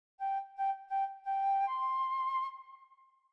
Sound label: Flute - Melody